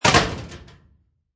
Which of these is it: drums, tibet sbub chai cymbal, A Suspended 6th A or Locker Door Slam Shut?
Locker Door Slam Shut